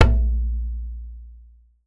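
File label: large brass, dunbek; Voice Crystal - Steve Reid Definitive Percussion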